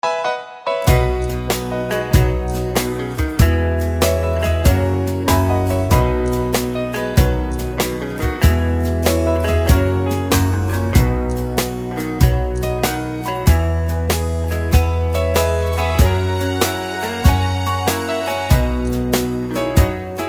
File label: (B) No Backing Vocals